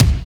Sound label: HARD DNC K; Drums In Your FACE!